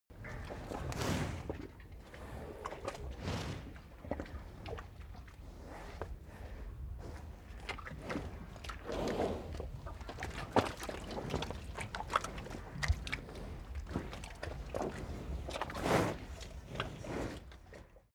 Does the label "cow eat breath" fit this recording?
Yes